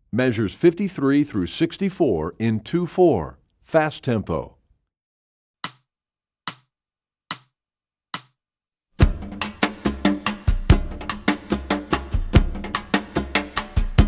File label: Rhythm Studies, Percussion; EE2000 Book2